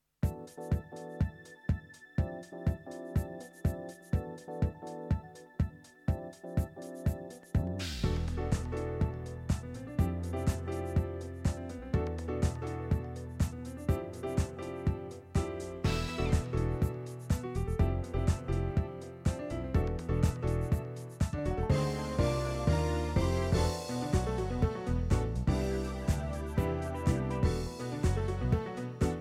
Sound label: casio px5S